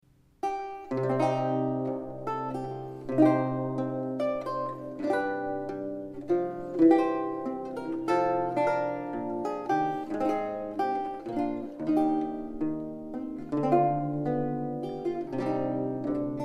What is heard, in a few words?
Courante; Ballard